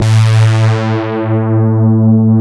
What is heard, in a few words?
CMI PIANO; Wizoo; Powered DX